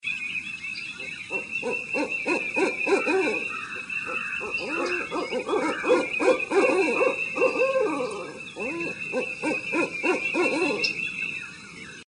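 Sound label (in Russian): zvuki sovi, owl sounds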